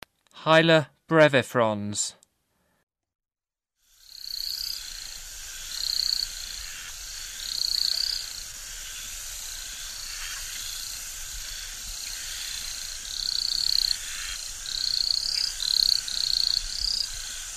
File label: Hyla brevifrons, Frogs of Ecuadorian Amazon; S. America